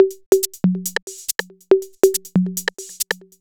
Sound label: 808 Loop, Drums Beats